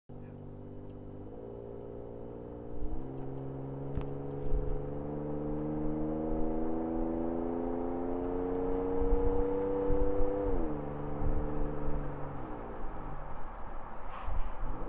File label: exhaust